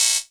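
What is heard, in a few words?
Hihat Closed FX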